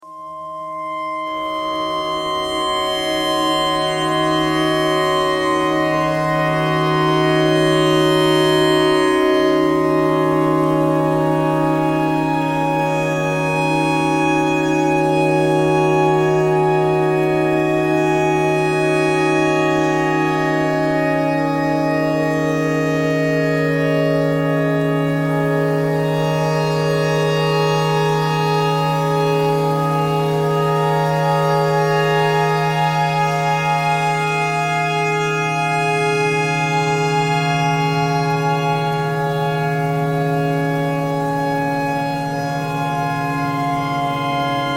tornado siren clarinet notes e thread pentatonic

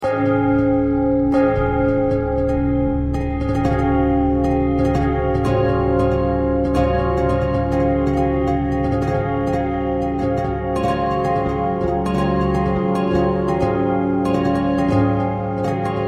bell bell thread simple, bells

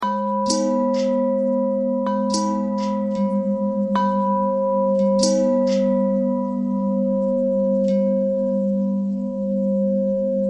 long bell bell thread pentatonic, bells

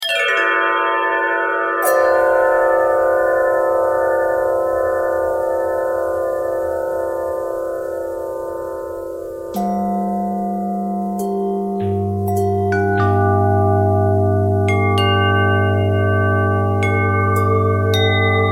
bell twist

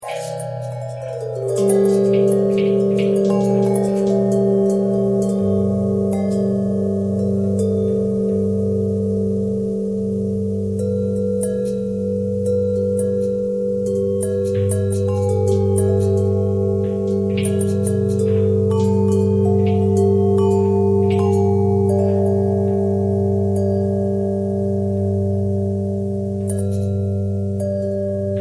bell long bell bend twist, bells

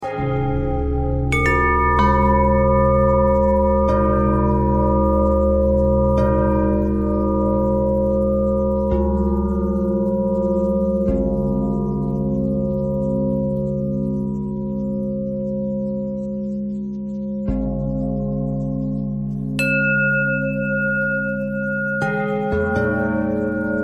bell bell thread pentatonic, bells

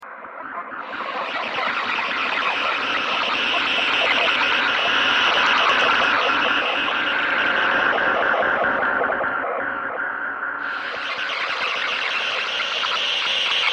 weatherradio thread noise

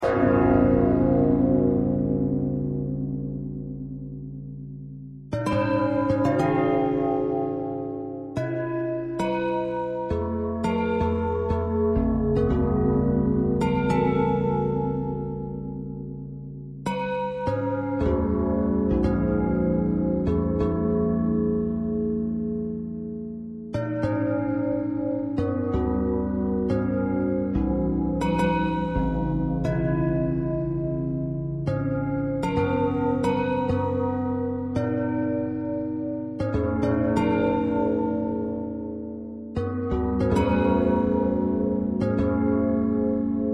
bell thread noise

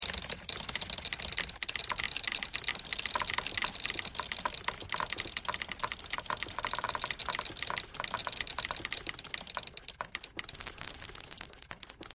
typewriter thread simple all

